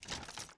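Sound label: Reload Shift Movement; Valorant; odin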